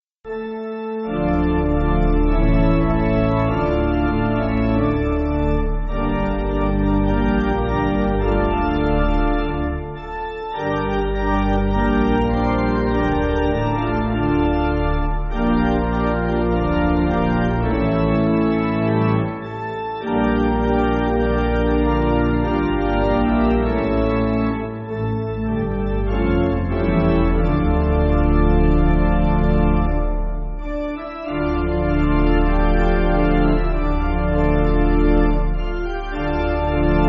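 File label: Pipe Organ, Backing Files for Churches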